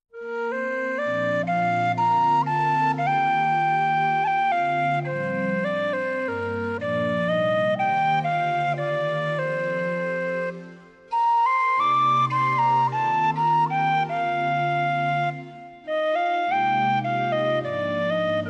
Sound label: Hymn Tin Whistle Solo